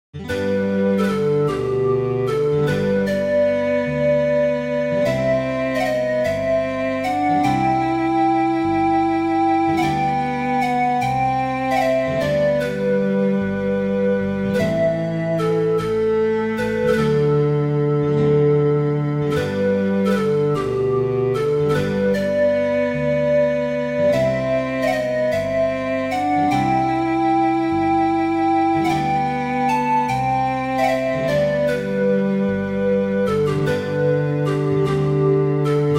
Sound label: lullaby